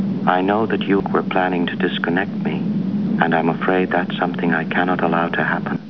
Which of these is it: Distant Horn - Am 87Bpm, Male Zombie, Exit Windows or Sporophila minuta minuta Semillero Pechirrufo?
Exit Windows